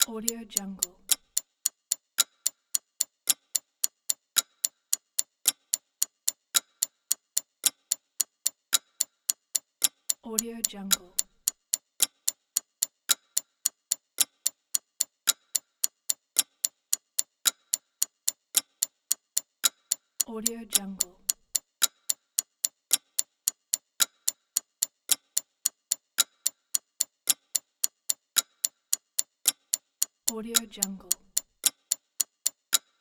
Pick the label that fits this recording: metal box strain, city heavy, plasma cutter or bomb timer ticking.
bomb timer ticking